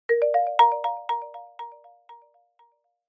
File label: multimedia alert system